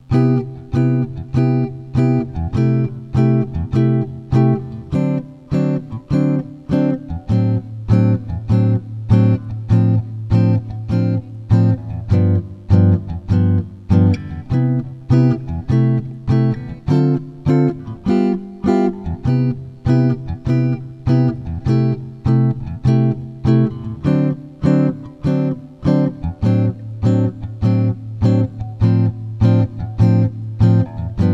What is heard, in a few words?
Simple Comp, jazz tunes